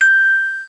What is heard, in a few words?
Ding